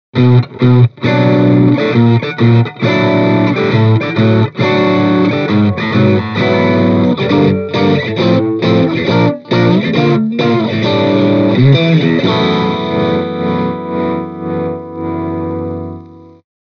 Mini Rox Mic Inside PRIVATE JACK Overdrive Stratocaster